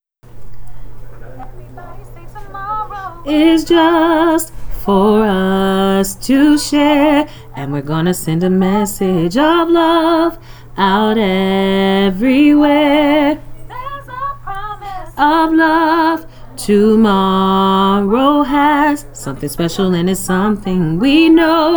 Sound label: Tenor